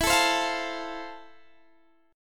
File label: F Major